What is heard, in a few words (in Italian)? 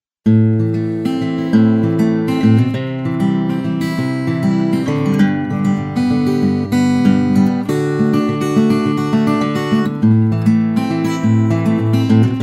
Acustica; API